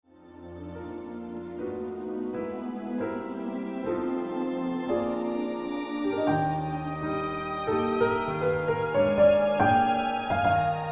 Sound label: Nocturno Eb Major